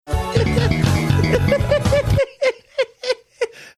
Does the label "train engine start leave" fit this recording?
No